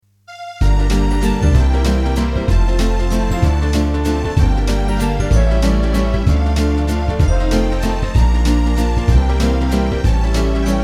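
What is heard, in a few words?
choir, backing track; khor zaklyucheniye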